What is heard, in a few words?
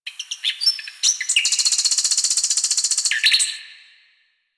Tree Critter, Drifter Camp Valley ambience; One Offs; Warframe; Quests; The New War